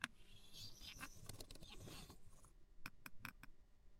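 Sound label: slow injection, syringe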